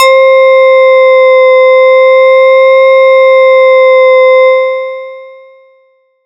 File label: SY TANGO; ModelCycles